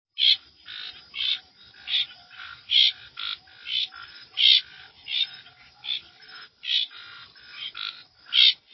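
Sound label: Scinax nebulosus, frog; guyane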